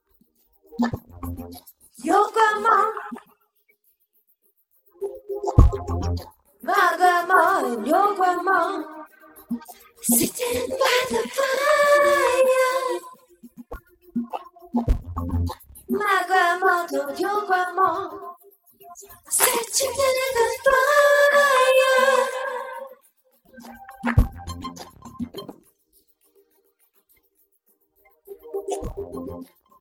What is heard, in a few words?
vocal